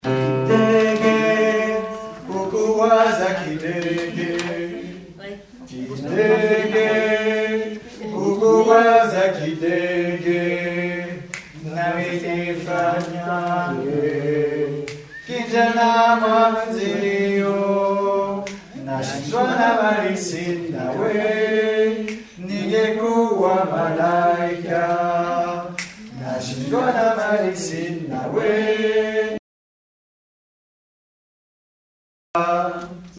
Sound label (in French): hommes; mes 29-48